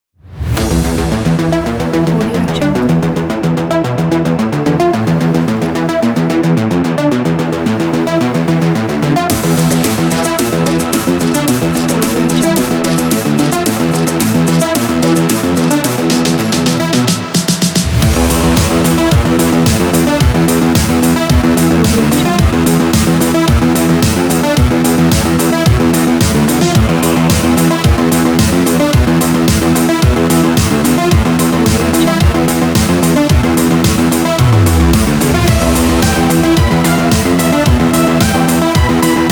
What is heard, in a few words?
cyberpunk electro